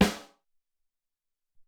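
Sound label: Crisp Snare, Alesis SR-18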